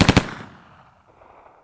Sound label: smg1 burst